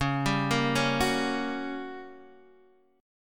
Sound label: G Minor Major 7th Flat 5th, Db